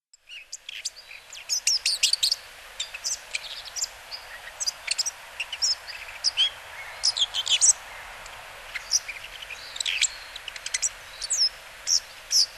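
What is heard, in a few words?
Bull-headed Shrike; mozu; hyogo; koe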